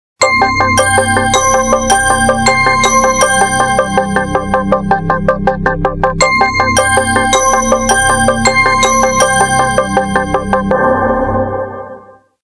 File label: ring, phone; HP iPAQ Glisten